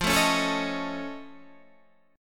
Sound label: F Augmented 7th F Guitar Standard, chord; F+7 strummed; 13,12,11,14,14,11; JGuitar